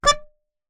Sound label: Melodica Short Note